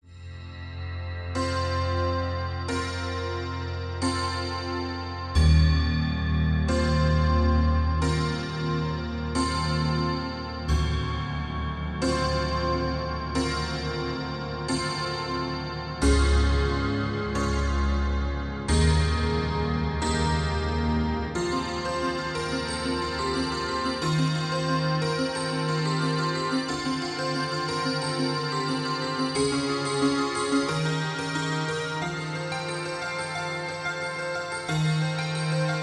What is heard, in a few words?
piano